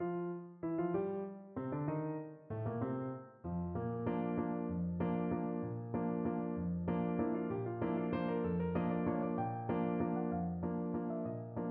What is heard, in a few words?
Voices of Spring piano